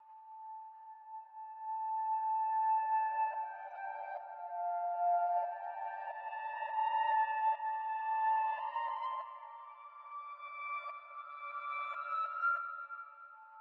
Nostalgic Melody Loop - 143 BPM F Maj Swelling Pad; Melodies; Loop Stems; Cymatics; Oracle Sample Pack